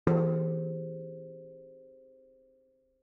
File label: ambient